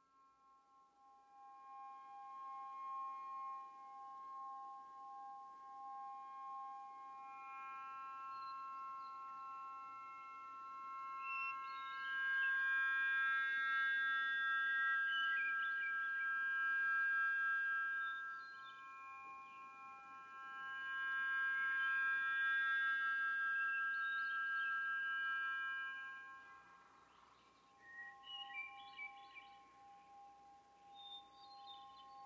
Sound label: Wind Harp